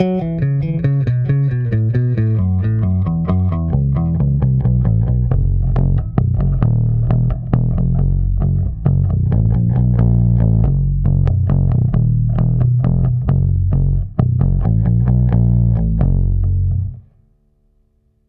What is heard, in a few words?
bb735a active bass and treble 3/4 mids full